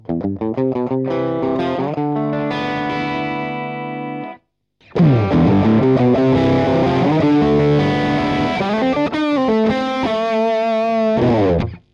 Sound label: Distortion